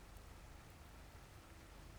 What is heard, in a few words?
pulses, BALAENOPTERA; MONACO 2022-07